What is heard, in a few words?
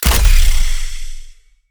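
military crossbow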